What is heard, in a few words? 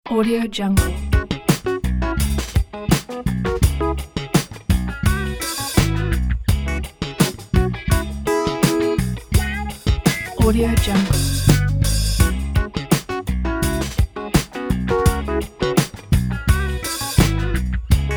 iron funky